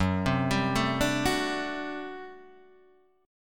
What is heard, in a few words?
G Major 7th Suspended 2nd Suspended 4th F sharp